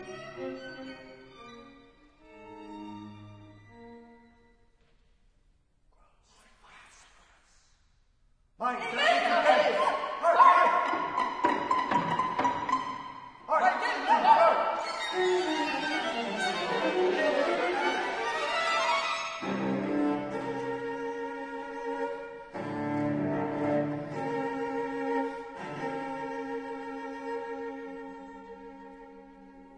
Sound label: String Quartet